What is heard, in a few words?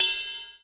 HIHAT; jazz jackrabbit 2; M8